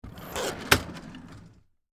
File Cabinet Drawer, Open, Fast, Full, Wood, Antique; Foley